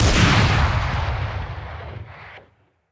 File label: Sniper Shot; Unity 2D Game